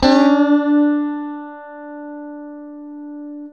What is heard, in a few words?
STRAT GTR; Synclavier Sampler Library; KEYS AND GUITARS